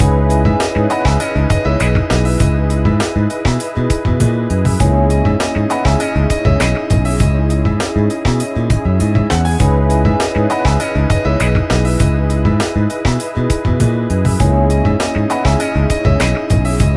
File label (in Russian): Ночной поезд: музыка для подложки